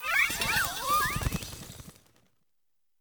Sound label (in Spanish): Grito de Espathra; Categoría:Gritos de Pokémon de la novena generación